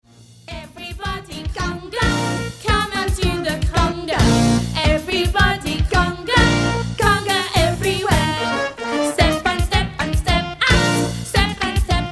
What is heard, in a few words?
Conga